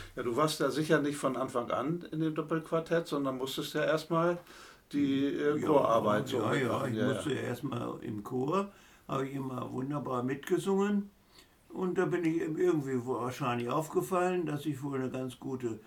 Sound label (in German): Doppelquartett